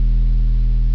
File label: light hum; ambience; svencoop; azuresheep